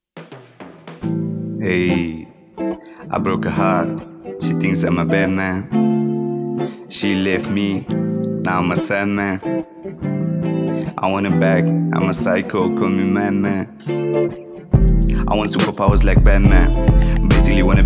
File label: Rap Song